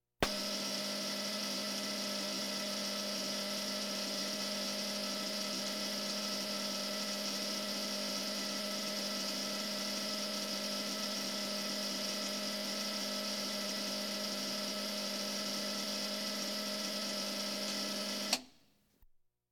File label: Police, Fire engines